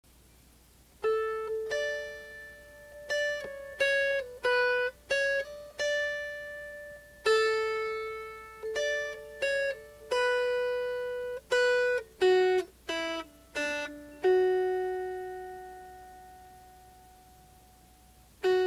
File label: Melody; Duermete, Nino Lindo